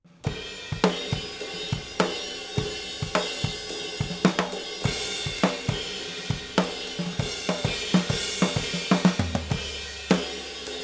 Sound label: oh cymbal shield